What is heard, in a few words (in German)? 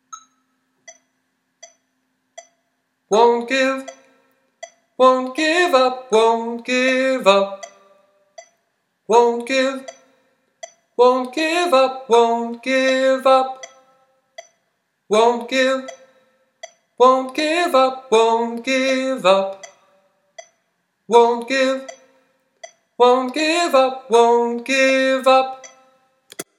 Endteil Tenor